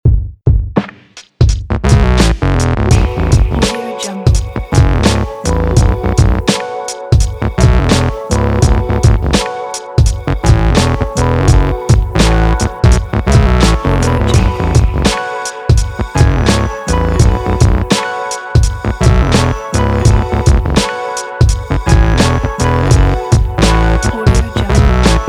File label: hiphop chill